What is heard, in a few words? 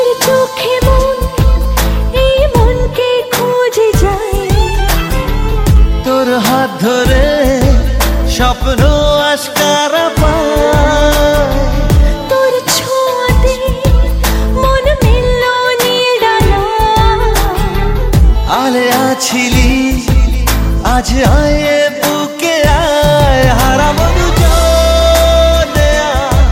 SONG RINGTONE